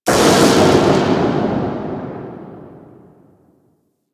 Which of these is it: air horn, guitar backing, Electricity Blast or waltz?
Electricity Blast